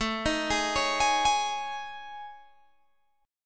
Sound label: A Major 7th Flat 5th, Guitar Open G